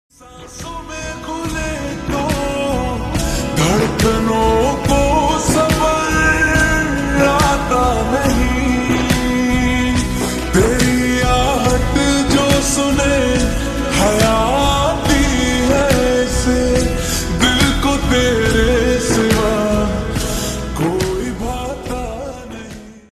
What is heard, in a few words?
Slowed Reverb Ringtone